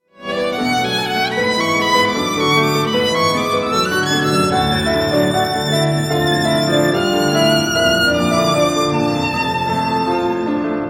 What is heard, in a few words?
sonata in e flat major for violin and piano introduction lento allegro